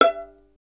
STR VLN, Solo Instruments; Miroslav Vitous

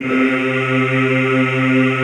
C2, Classical Choir; Best Service; Extended Classical Choir

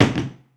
BOOT STOMPIN

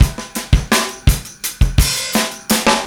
Drum Monkey 84 bpm, breaks; Zero-G Beneath the Planet of the Breaks

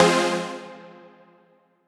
Fm Trancidic, Chords; Electronisounds

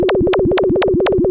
Moog C2; Hollywood Edge; Giorgio Moroder Rare Synthesizer Collection; MLTI.MOOG

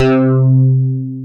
SYN, S CLAVI

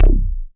K-2000, Synth Bass; BA1 Synth-Basses; Sound & Vision - Gigapack I CD 2

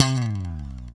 BS, E.Bass FX

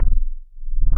loop, techno atmos

